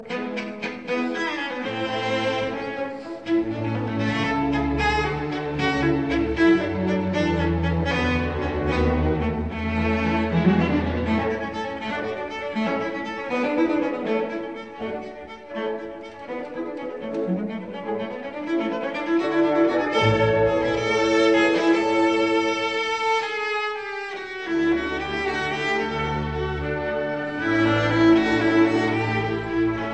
Cello Concerto No 2 in D major Hob VIIb2 III Rondo Allegro; Haydn; Carl Schuricht